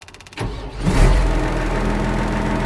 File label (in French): Démarrage